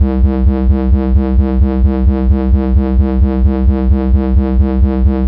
Dirty Pulse Lfo

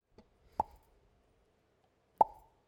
Bubble Pop

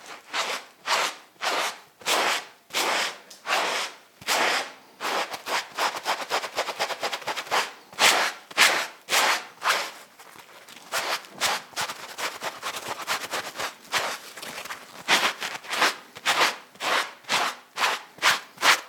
Dry Sponge Scrubbing

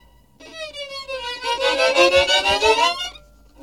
Violin Frightened

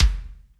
Yamaha Kick Medium

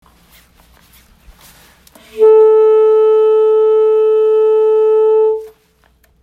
Saxophone F Sharp

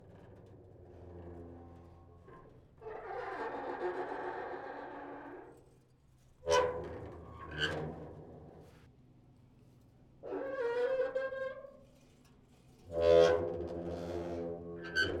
Open Close Old Metal Door At Ruin

